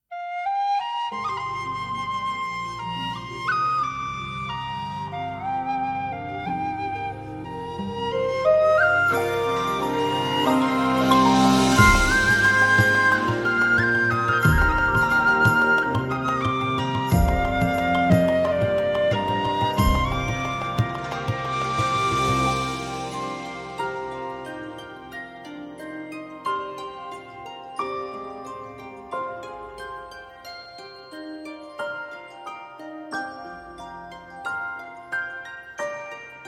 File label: bgm